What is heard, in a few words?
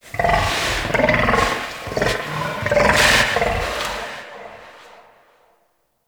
Forest Distant Medium Creature Medium Gurgle Snort; Warframe; Grineer